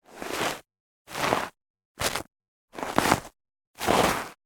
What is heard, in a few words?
Snow Slide Medium; Alexander Fokshtein; Snow Steps